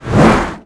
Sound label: cam zoom out